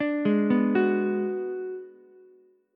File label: G sharp Minor 7th Flat 5th G sharp down Ukulele; G#m7b5 arpeggiated; JGuitar